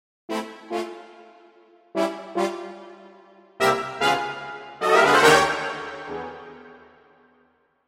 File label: Intro brass